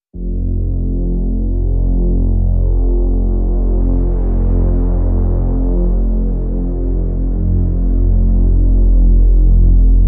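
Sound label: Drone Bottom Floor F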